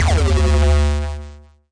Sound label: nasty stormfront, Electro FX